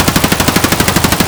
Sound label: aps indoor distant loop; Escape from Tarkov